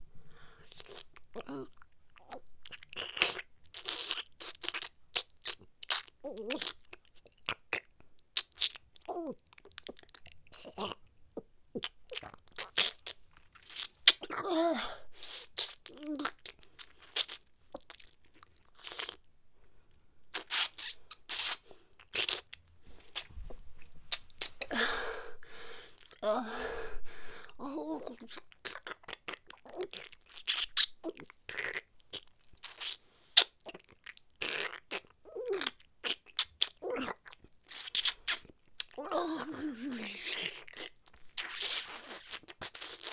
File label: vacuum dthro; 鬼畜イラマチオ・バキューム・ごっくん